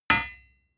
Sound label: hammer hit steel